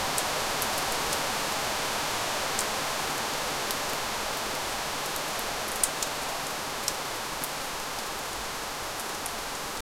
late autumn rain with a

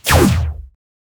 lazer transitions stinger

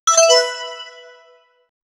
casual game win modern so

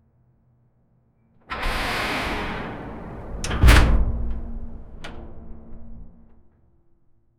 a heavy metallic thud or

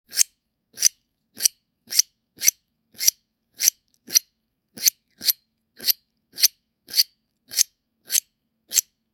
cutting

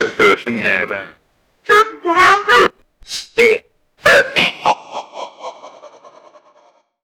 the sound of ancient egyp; egypt

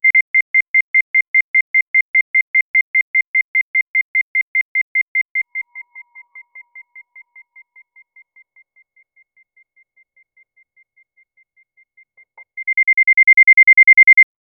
sonar sound speeding up; navigation

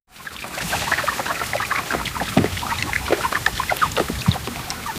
elephant running into wat

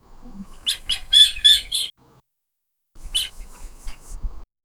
the sound and sniffing of, animal behavior

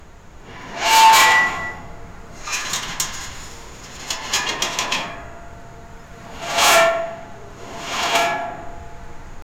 massive creaking sound of, building

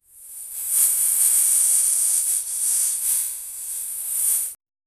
create a sound that feels, air

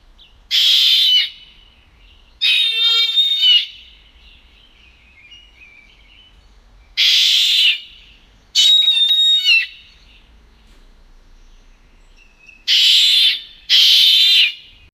bird scream sound, animal cry

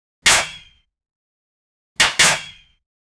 ak shoot sound rifle

